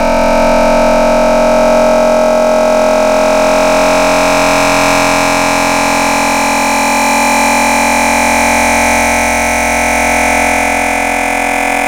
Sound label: Squarified Vomit; Wavetables; Cymatics; Vomit for Serum Vol 2